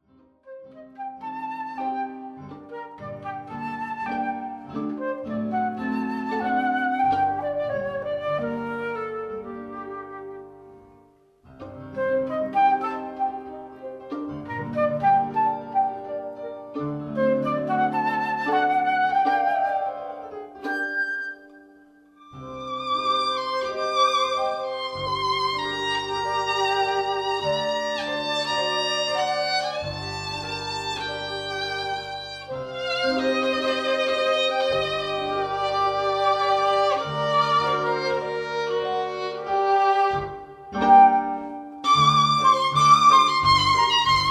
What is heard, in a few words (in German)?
Zwei Gitarren